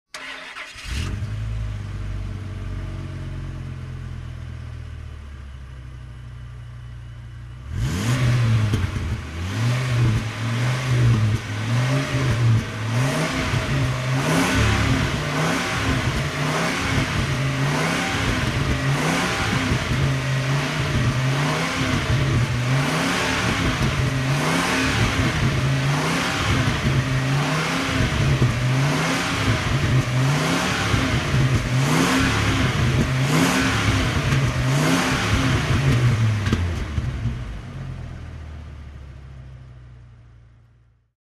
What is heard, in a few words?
Truck V10 Motor Revs, dodge ram v 10